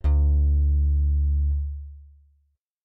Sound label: d1 bass; matching game